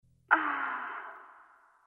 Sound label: moan ringtone